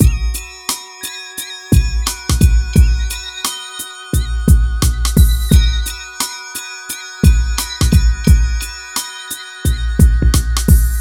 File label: MIX, Hip Hop and R&B Drumloops